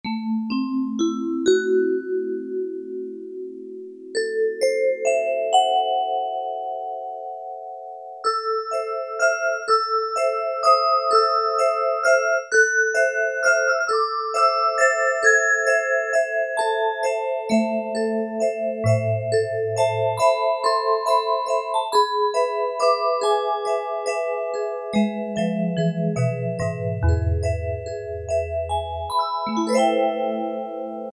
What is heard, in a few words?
VZ VIBES; Internal factory combi